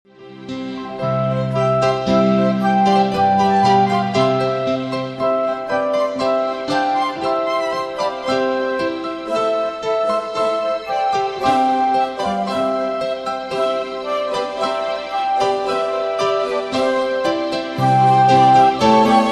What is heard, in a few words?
Instrumental Joyous Glory Angels Sing; Christmas